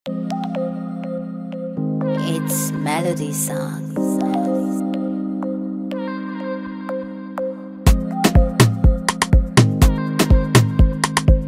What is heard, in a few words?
Instrumental